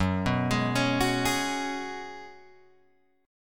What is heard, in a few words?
Gb Suspended 2nd Suspended 4th Gb, down, Guitar, Open G